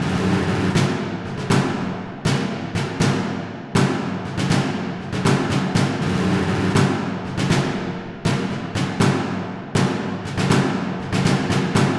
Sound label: Snare, малый бараба; звук для батальной сцены